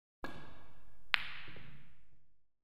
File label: Snooker - one shot; The BBC Sound Effects Library; Sport and Leisure